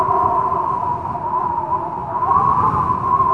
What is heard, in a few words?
wind strong